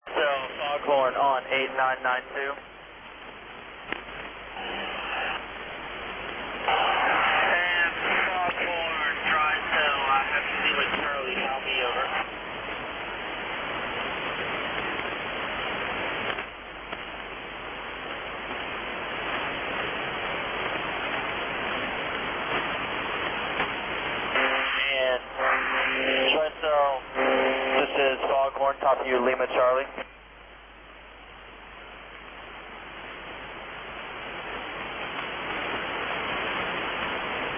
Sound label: dry squeal far gone